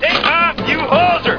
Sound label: takeoff